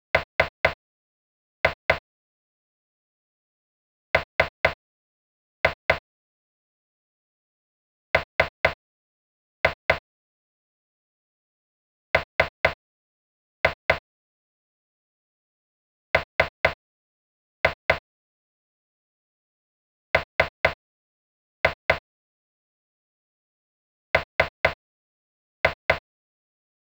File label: sidestick; hydrogen